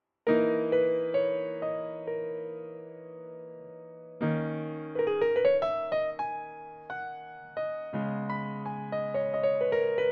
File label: jazz ballad